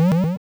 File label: snack pickup; Airport Runer